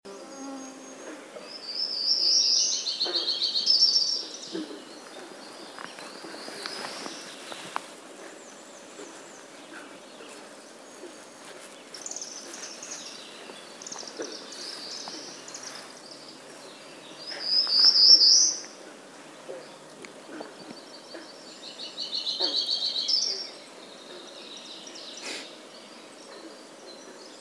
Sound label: later AM Bird Chorus West Pond